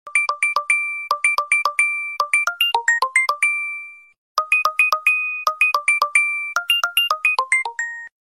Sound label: swiping card jinggle bell